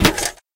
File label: click